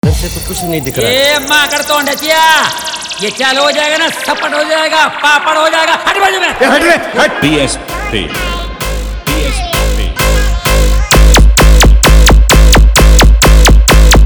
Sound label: Music New Beat Remix